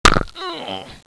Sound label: fall pain